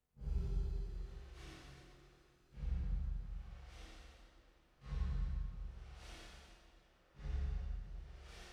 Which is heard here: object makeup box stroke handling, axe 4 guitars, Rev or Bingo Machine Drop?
Rev